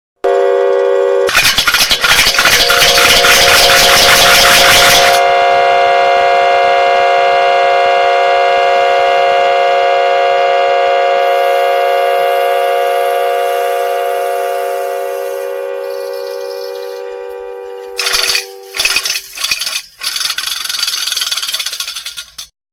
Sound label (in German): brummkreisel